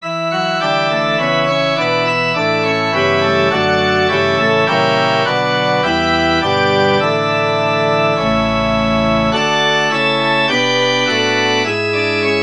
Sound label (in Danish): forspil; altid frejdig